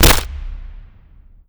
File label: bolt huge blast